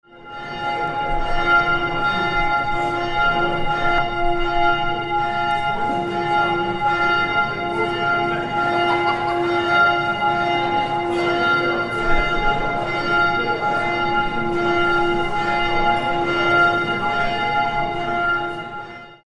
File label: st florian bells; 2012 Bruckner Tour